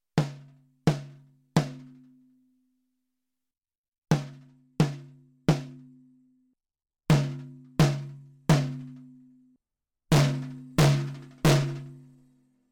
Snare clean steel

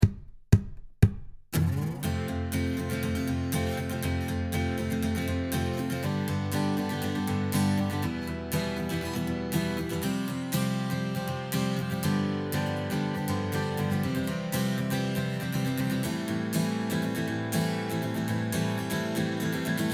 Strummed

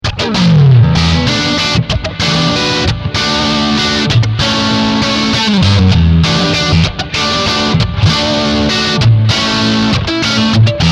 Neck Blast

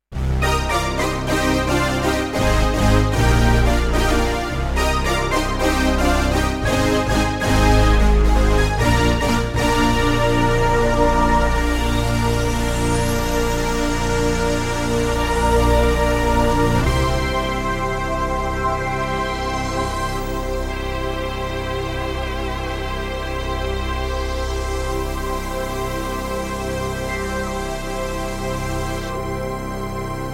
Brass Hold and Sweep

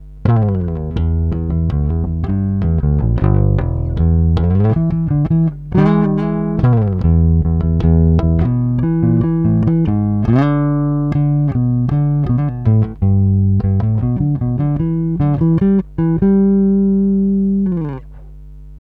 Block 300B neutral DI

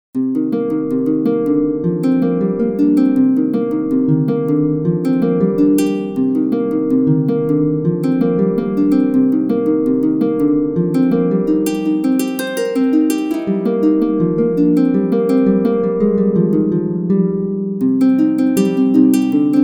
Kora

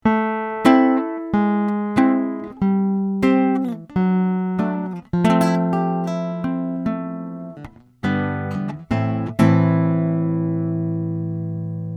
Takamine 135SC Traditional

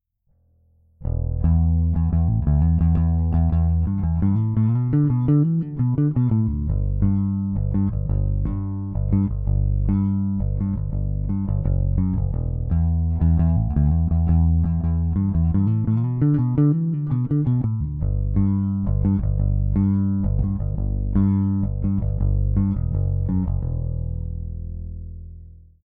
Digi002 Bass

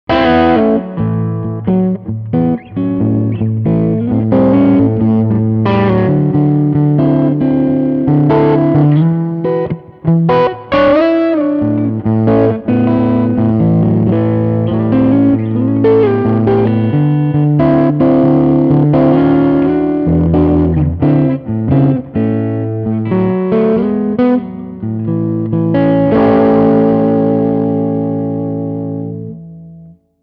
Preset Blues

